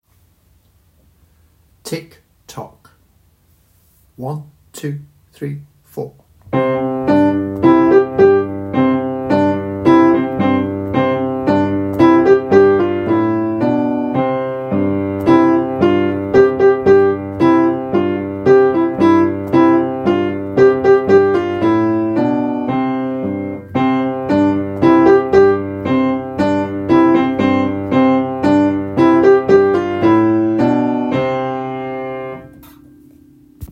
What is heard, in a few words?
Tick Tock